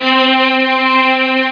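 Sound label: ens strings C3, ensemble strings; ens strings C4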